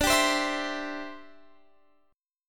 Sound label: D 7th Suspended 2nd Suspended 4th, Guitar Open F; D7sus2sus4; strummed